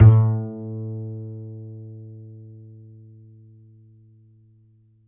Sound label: G, G string upright bass; Upright Acoustic Bass